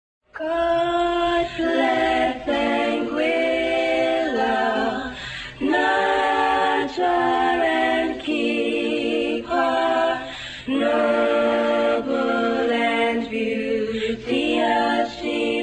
Himno de Anguila

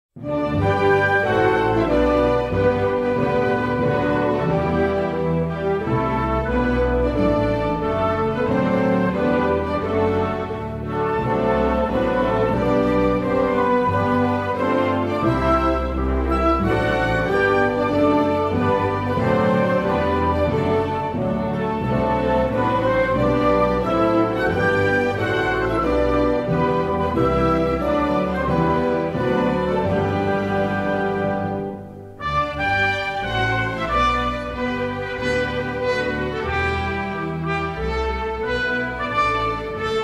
Himno nacional de Islas Feroe

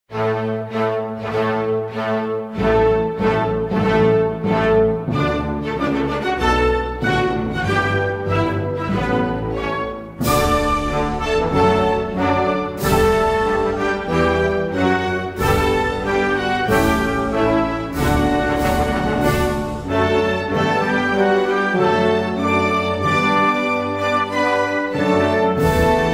Himno Nacional de Granada

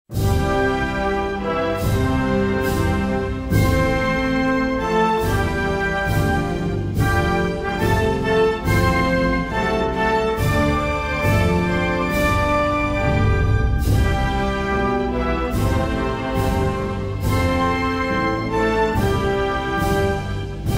Himno Nacional de Timor Oriental

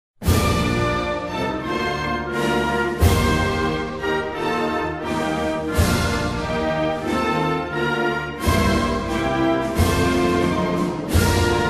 Himno nacional de Santa Lucia

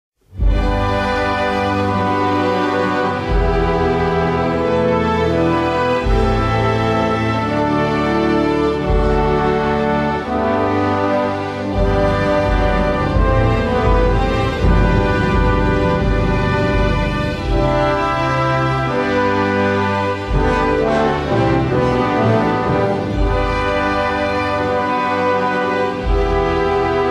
Himno nacional de San Marino

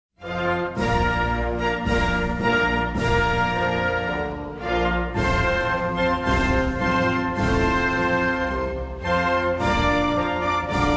Himno Nacional de Liberia

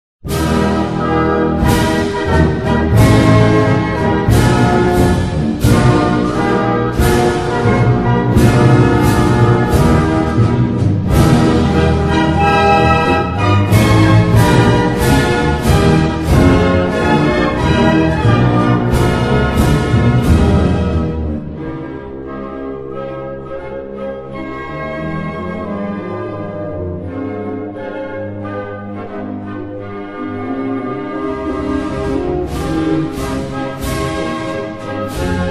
Himno Nacional de Moldavia

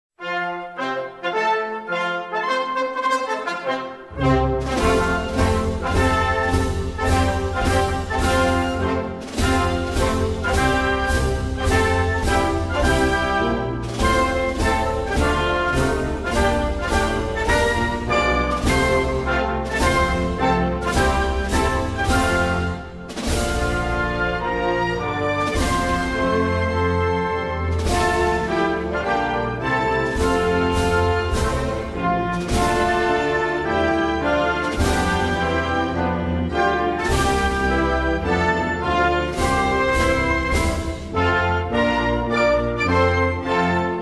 Himno Nacional de Chad

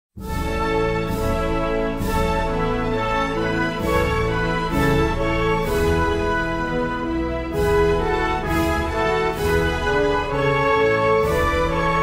Himno Nacional de Lesoto

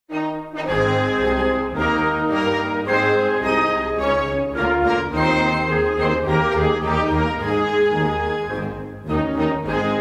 Himno de Samoa Americana

